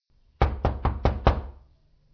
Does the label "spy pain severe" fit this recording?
No